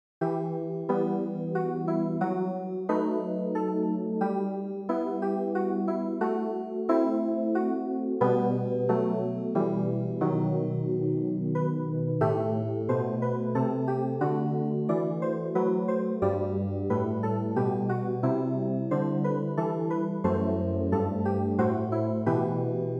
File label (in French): CLAVIER